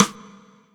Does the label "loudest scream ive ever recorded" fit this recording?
No